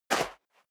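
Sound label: step dress boots sand single right, footsteps; schritte; menschen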